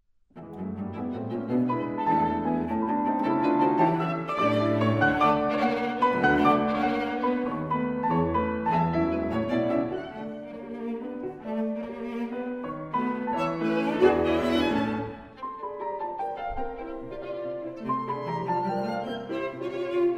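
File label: piano trio; barnekow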